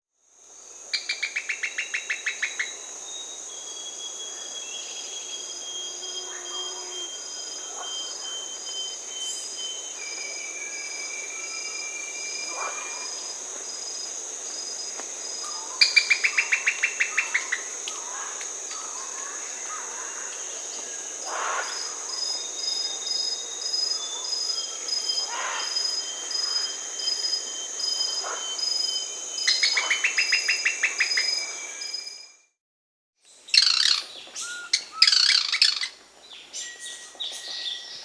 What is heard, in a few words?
White-cheeked Tody-Tyrant (Poecilotr; S. America